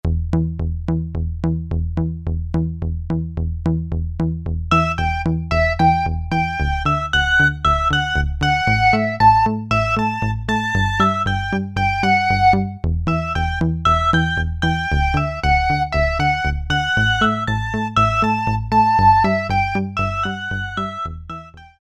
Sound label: Bass-Lead, Roland SC-880; Factory Patches